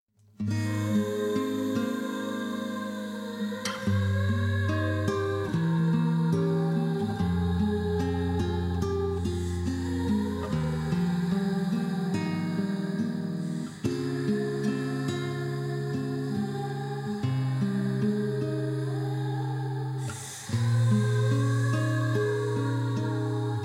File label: Heaven's Song Whisper Vox